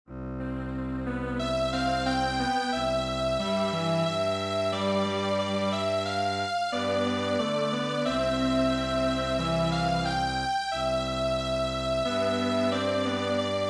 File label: Valse Strings Quartet; midi; traditional